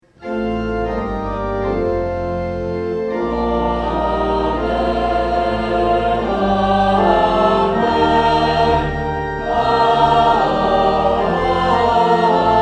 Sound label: Amen; St Francis